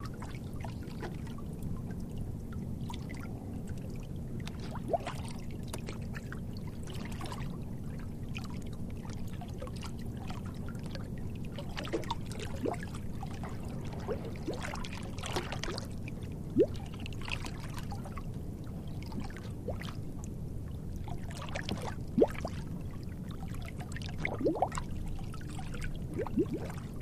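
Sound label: Water Lap Light Movement, water laps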